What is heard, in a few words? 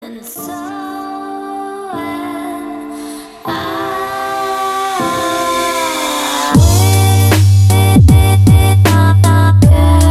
instrumental